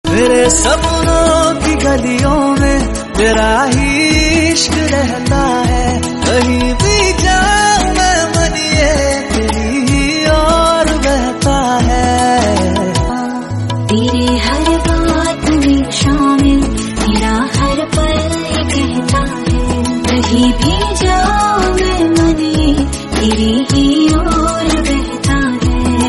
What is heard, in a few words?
romantic hindi